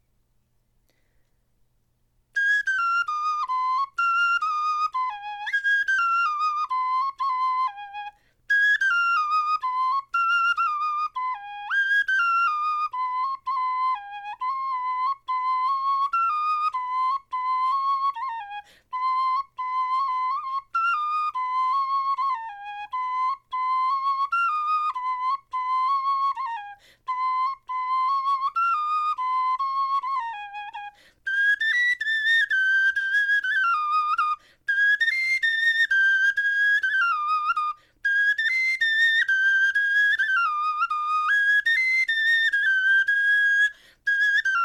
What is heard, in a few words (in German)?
melodie